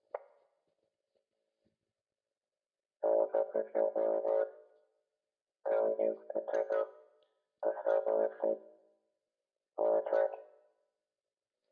HALLOWEEN ROBOT

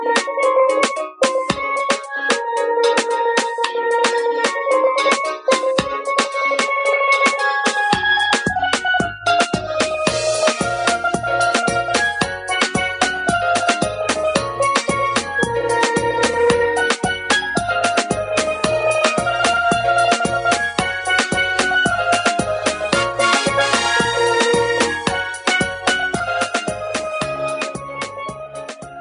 Steel Pan

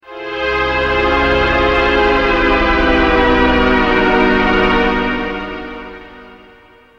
Descending

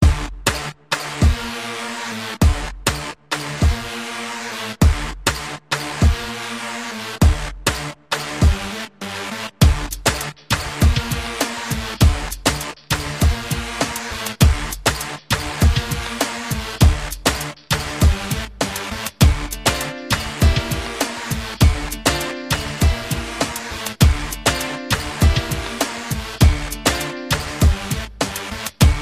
Rocking Music

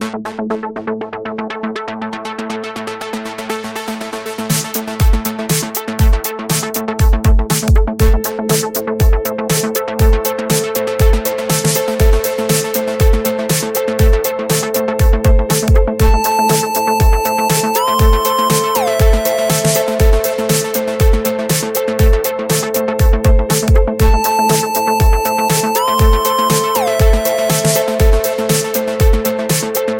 Alien disco

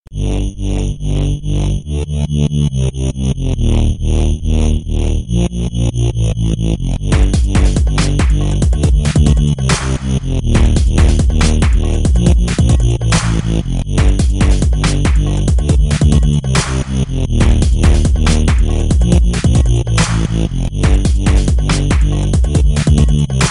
Bass Style

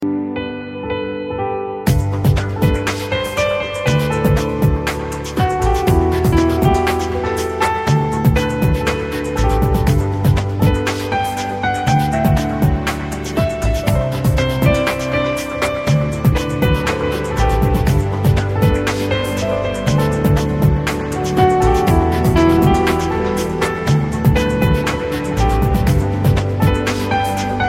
Romantic Tone

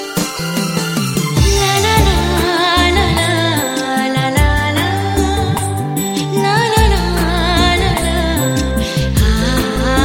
Ringtone bg music

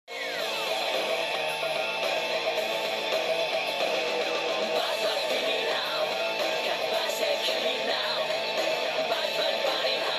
beating heart; meloboom

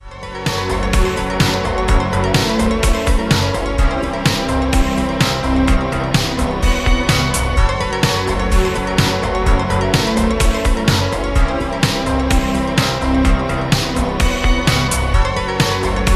80s Halloween Tone

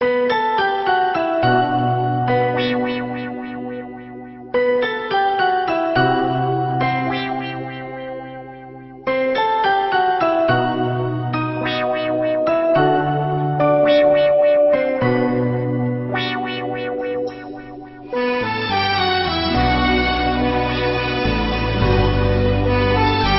Classical

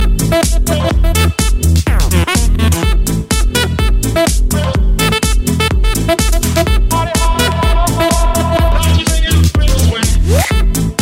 Swing

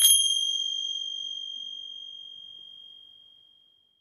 Tibetian Bells

